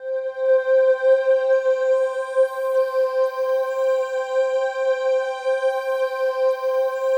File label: PAD, DIGI-PADS